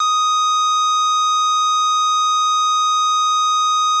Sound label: square, 808, SubOsc; hiphop; StudioLinkedVST 808 Kontakt